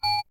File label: Buzzer; Huawei U2801